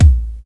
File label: eighties kick drum one shot a sharp key